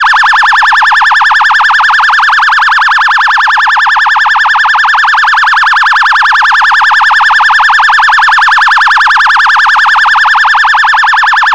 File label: electronic phaser siren